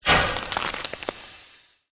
Created Magic Spell Ice Bolt; Series of created spell sounds

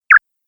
Space Future Communication Sound, sci fi

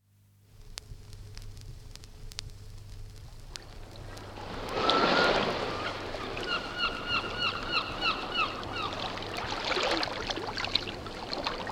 Sea Lappi, Sea lapping round rocks; from 78 disc; Ocean; Nature; BBC

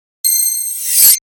Hit Bell Chime Ding Shimmer Sweeper; Production Element Imaging Element Accent Transition; 5.1 STEREO MIX; Blastwave

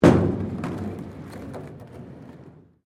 SKATEBOARD HALFPIPE METAL FALL; Skateboarding; Sports

